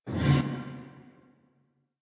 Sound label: Scrap Container Dome In; Warframe; Missions; Zariman; Zariman Assassinate